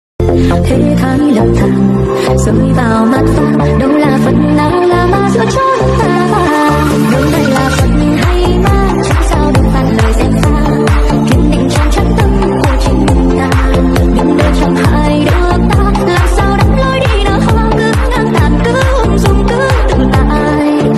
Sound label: remix